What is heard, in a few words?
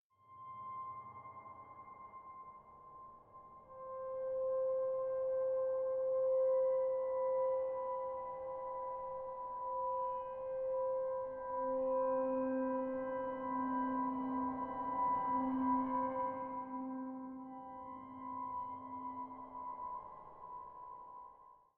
Music Stinger Nightmare